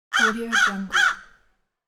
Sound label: crow caw; audio-tree